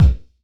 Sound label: Pound Sonority, Kicks; Airwindows